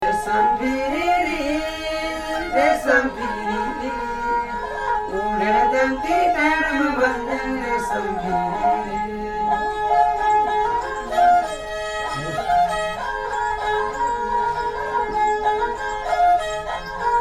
minstrels, nepal

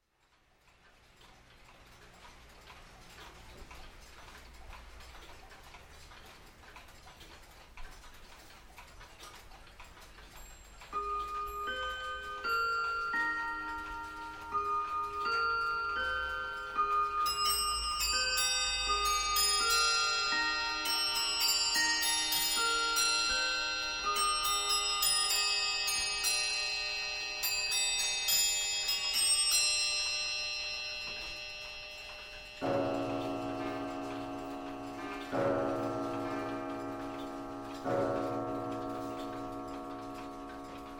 Clock Shop, Cork

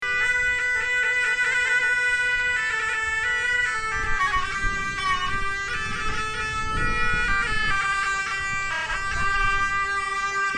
horns, nepal; Marpha

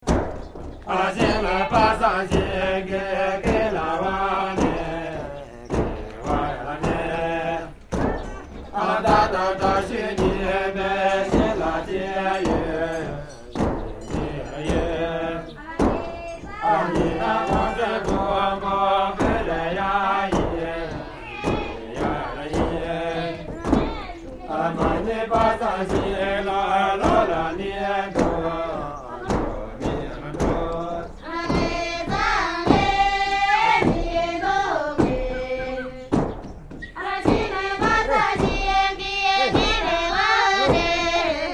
lhasa tibet jokhang roof work chant; Quiet American; aaron ximm

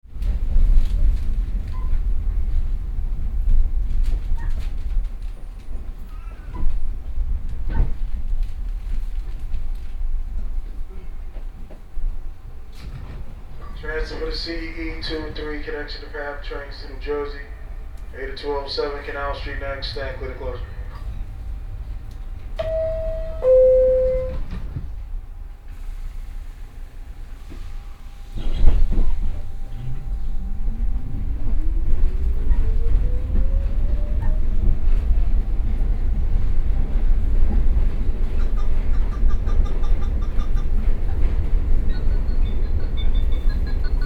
NYC subway

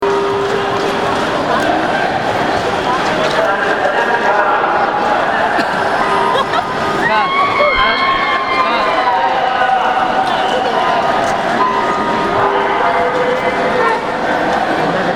thaipusam

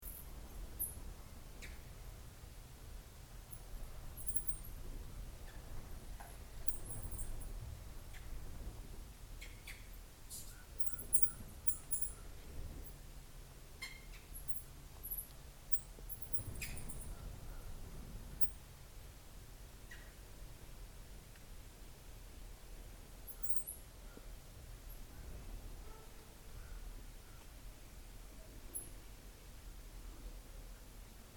bats

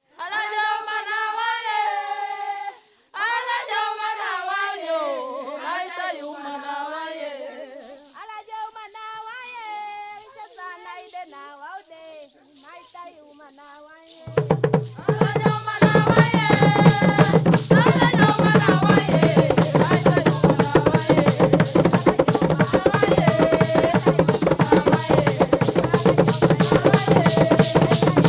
Danse Awassa

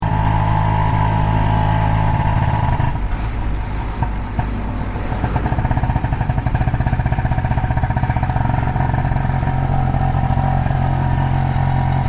tuk tuk Ventiane

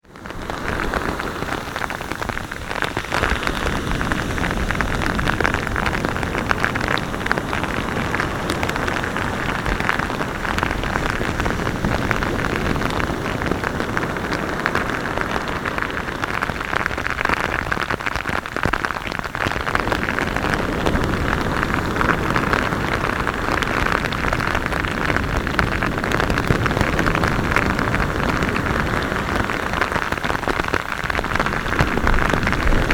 beach rain, vietnam

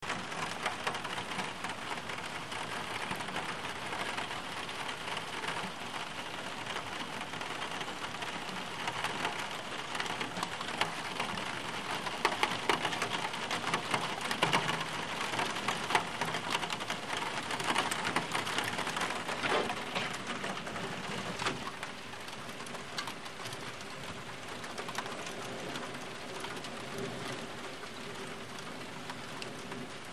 rain

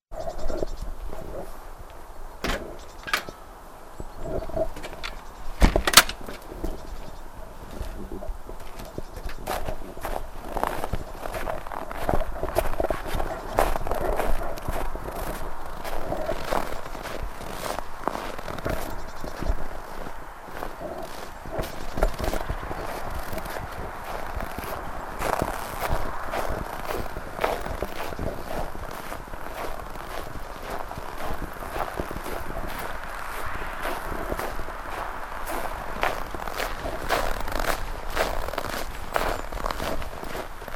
Snow Walk, Rattvik Sweden

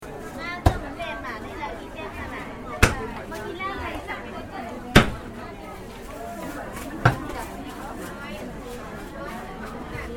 meat market, laos

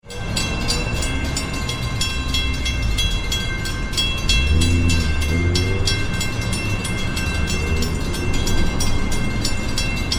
Caltrain

